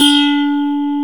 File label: RISEING, SYNTH; AKAI S-Series CD-ROM Sound Library VOL-2